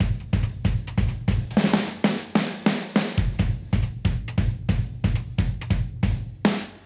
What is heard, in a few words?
Modern Breaks; Touch Loops; ClassicBreakSessions